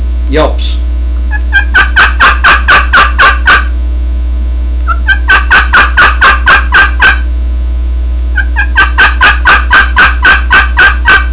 copper yelps, turkey; Southland Sounds

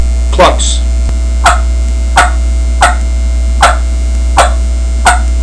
lost hen clucks; Southland Sounds

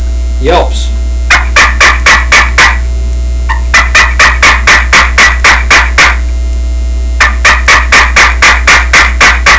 push box yelps, turkey; Southland Sounds

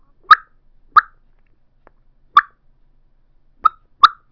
stingray mouth call clucks, turkey; Southland game calls

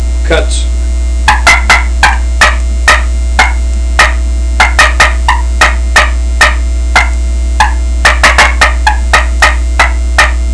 push box cutts, turkey; Southland Sounds

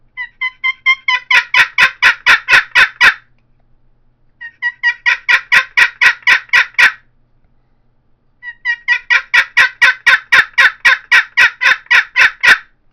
cedar maple boat paddle yelps, turkey; Southland Sounds

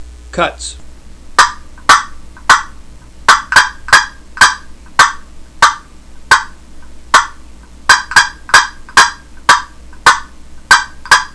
super yelper walnut cutts, turkey

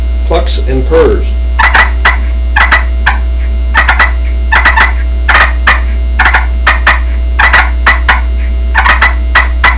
push box clucks purrs; Southland Sounds

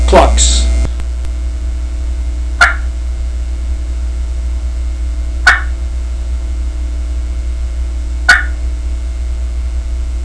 cuttaway clucks, turkey; Southland Sounds

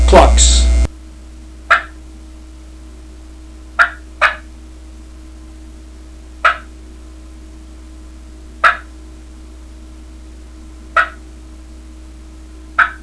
clucks, turkey; dixie

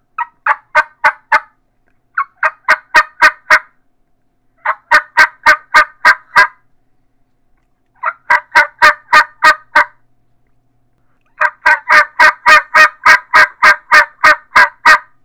smackdown yelps, turkey; Southland

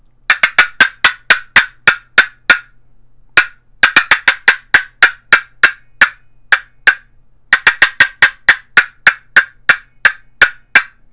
cedar maple boat paddle cutts, turkey; Southland Sounds

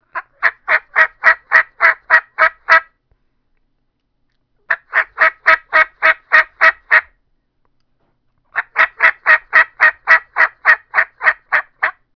dynamite mouth call yelps, turkey; dixie

